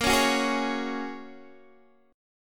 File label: Bb Minor 7th Sharp 5th, Guitar, DADGAD; x,13,11,11,11,11